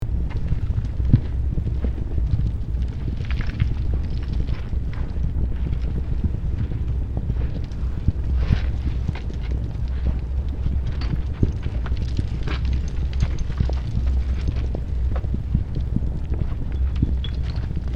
Volcanic debris rocks rolling ambience loop; nature

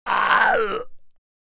Adult male retching deep groans; Puke and Vomit; Vomiting; gfxsounds

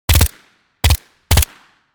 Commando submachine gun two three round bursts

